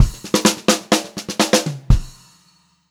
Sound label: 126 PO, Pop-Rock Drumloops